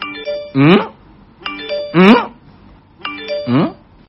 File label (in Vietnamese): am thanh thong bao cool